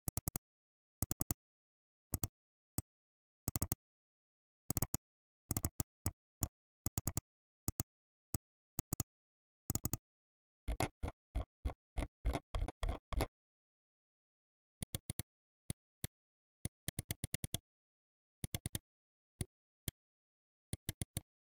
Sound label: mouse USB; devices accessories utilities; NS Studios - ultra sound collection